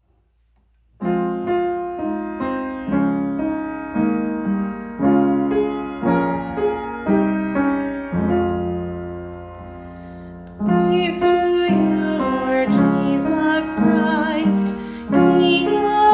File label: Mass Gospel Acclamation for Lent